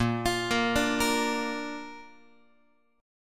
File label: A sharp Major, Guitar; arpeggiated; JGuitar